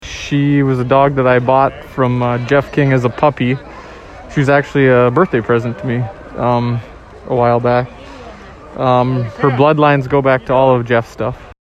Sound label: Viola